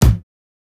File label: kick, house